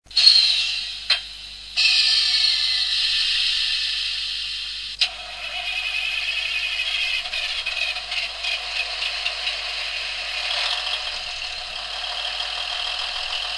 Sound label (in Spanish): motor, Locomotoras; SONIDOS ADECUADOS PARA LOCOMOTORAS; CAMELLO